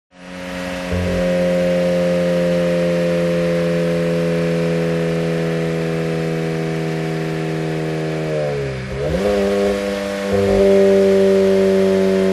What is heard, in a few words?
CAR Toyota GT86 Engine Brake; TRANSPORTATION